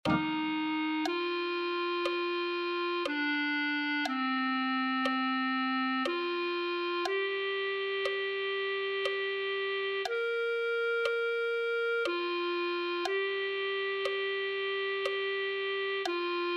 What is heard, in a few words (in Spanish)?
santo espiritu ven clarinete